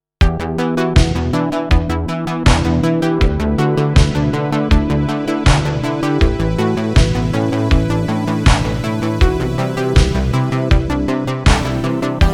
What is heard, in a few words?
Gyraf GXXIV Test 80s Mix limited